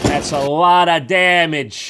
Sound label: damage; tf; saysounds